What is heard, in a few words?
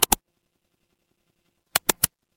office mouse click